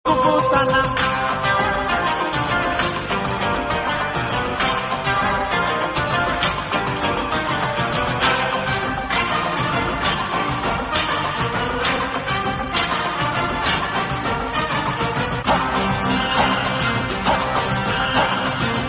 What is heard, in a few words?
Music Ringtone; Vishwatma